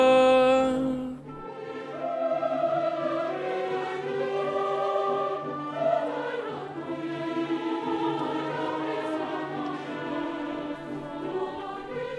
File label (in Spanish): TENORES; REPERTORIO ÓPERA; Danza Igor